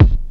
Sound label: sharp hip hop kick drum one shot g sharp key